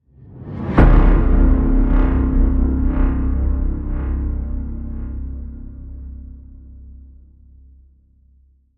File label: Hit Tonal Reverse; Reverse Felon